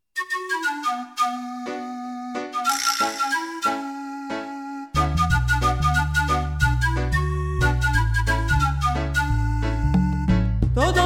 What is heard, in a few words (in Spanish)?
Orígenes Jingle